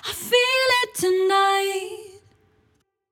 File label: vocal phrase dry high harmony feel it tonight 120 Em